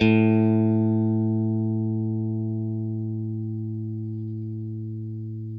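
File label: Stratocaster, Guitar; Quantum Leap; 90's Sample CDs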